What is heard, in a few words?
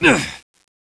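player pain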